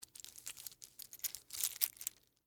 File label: Wrist Watch Off, Foley, Wrist, Watch, Take, Off; Clock Sound Effects; SoundEffects-BlastWave